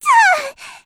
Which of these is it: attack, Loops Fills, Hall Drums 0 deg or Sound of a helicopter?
attack